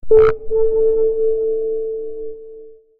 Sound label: high impact, alert